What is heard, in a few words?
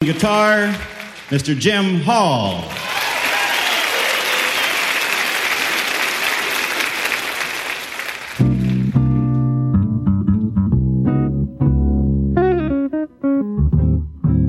jazz